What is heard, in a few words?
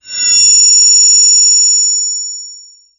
futuristic artificial sou, artificial